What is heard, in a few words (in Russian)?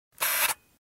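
короткий зум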